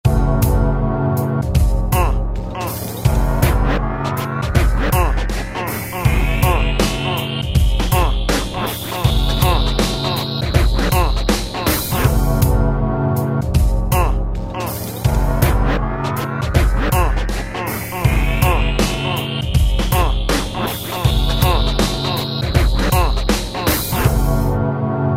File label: DJ Hop, Korg ES-1 Factory Patterns